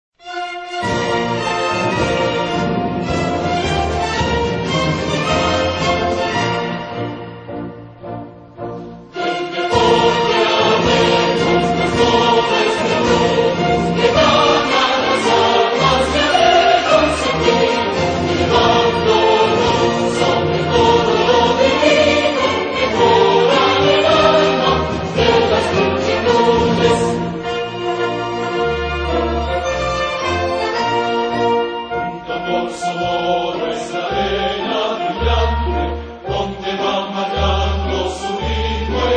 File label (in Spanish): Himno al Deporte; Ecuador